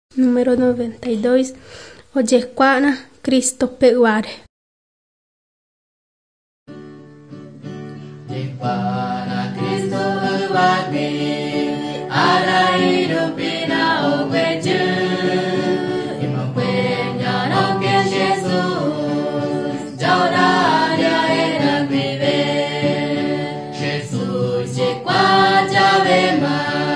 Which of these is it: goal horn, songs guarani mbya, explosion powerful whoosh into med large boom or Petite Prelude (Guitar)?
songs guarani mbya